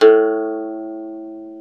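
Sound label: ethnic plucks; Eth Plucks, Pluck Duo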